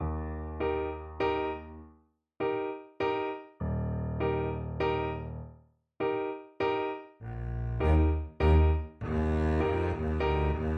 saint saens elephant double bass

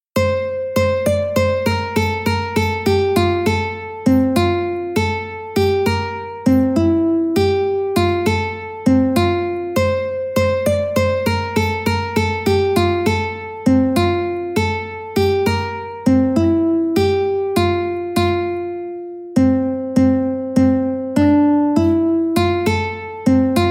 Mango Walk, ukulele

